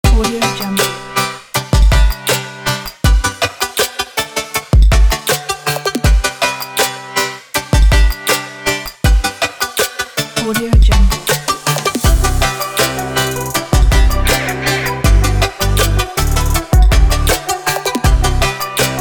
future jam kit

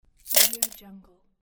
coins collected

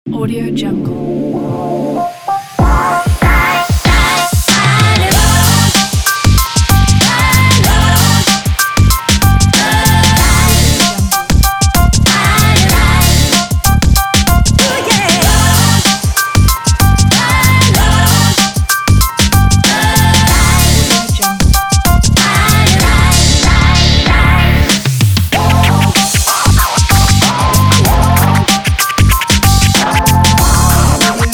hiphop

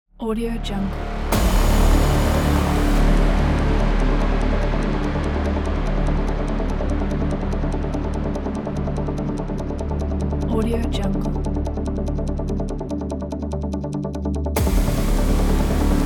dramatic epic cinematic synthesizer trailer

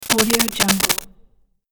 sentinel glitch

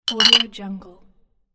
three bottles clink together

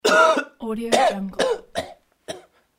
man sick cough

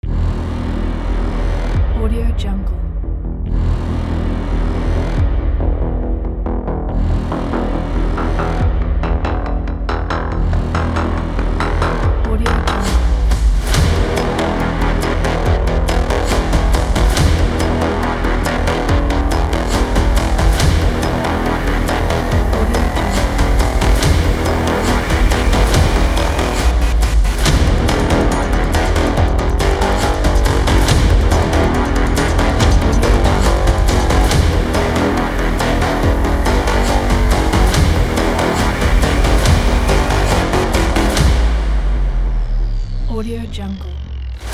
angry trailer; redfox

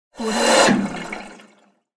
draining

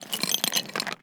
large iron chain pickup

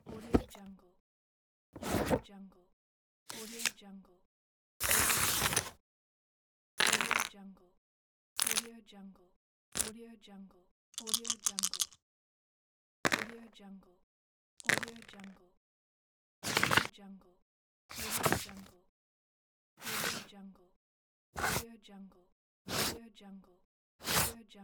board game sfx pack

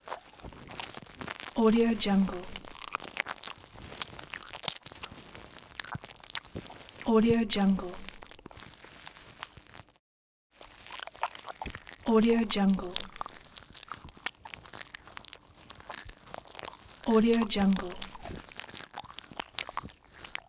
monster eating

